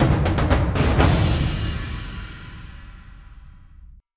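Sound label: Fawn level Up; Blackfaun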